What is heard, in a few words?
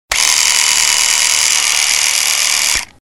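sound of razor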